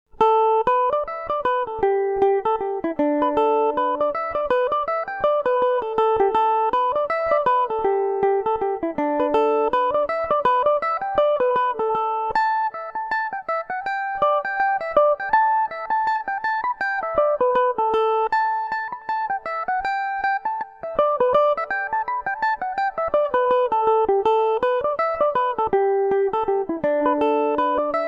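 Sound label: A dorian revelation, Irish mandolin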